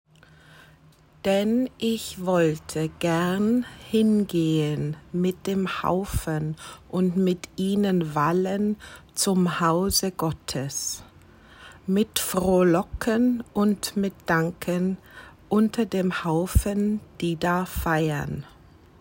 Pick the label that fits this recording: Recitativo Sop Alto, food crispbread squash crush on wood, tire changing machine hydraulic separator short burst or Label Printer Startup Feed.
Recitativo Sop Alto